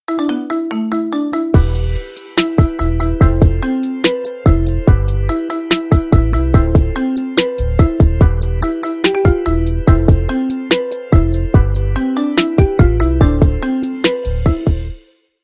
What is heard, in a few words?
marimba remix